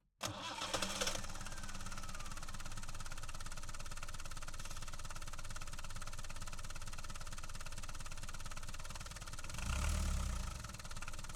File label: Morris Oxford - ONBRD - SLOW - Start Drive Stop Reverse Drive Stop - ENGINE - Center; Pole Position; Morris Oxford 1969; DPA4061